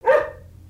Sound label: Pet Terrier Bark; creatures pets